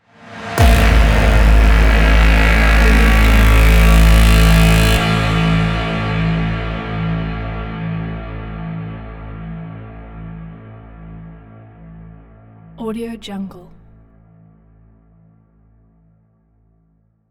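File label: cinematic mega horns; soundmorph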